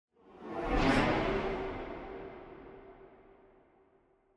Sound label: Slide